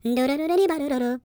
SCAT

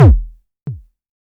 kick, Korg ER-1; 300 Drum Machines; Kit 15

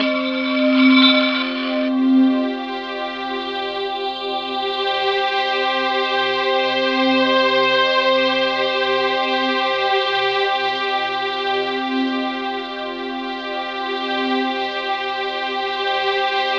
SYN FLANG; Sound & Vision - Gigapack I CD 2

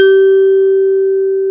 VIBRA; East West; Global Sounds

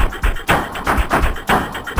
LOOP120; Sounds Good - Eurotech

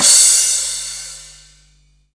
CYM, Dance Drums

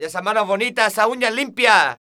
Flamenco, Vocals, Male

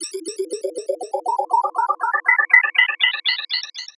SFX, Trance NRG; Sonic Foundry (Sony Creative Software); Trance NRG VOL-2

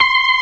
organ, all stops; Keith Emerson Signature Series

